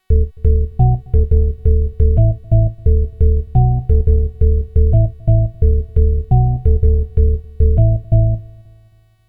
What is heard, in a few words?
Modal Craft Synth 2.0